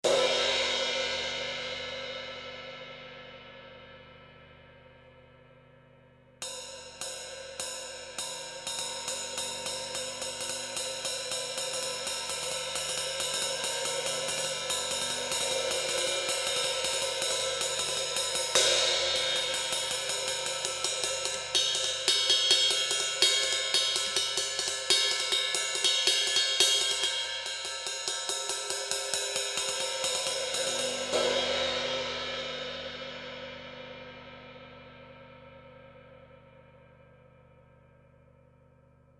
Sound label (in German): Becken, Samples